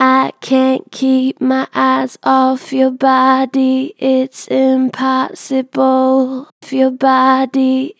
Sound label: Gentle Vibrato "I cant keep my eyes off your body", Vocals, Natural Vibrato Gentle; Harmor Vocals